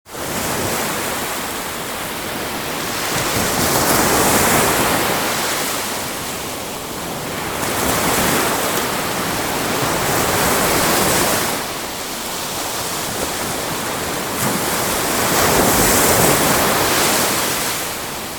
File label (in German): Der Klang des Meeres - Meeresrauschen